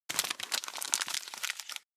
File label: Uncoated paper handling